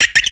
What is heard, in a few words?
Rat Squeak; DeusEx